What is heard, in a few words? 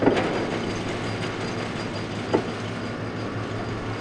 pumpjack loop; zrush